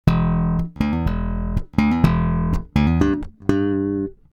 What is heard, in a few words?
slap, aria